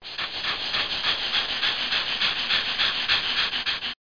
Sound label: pAnting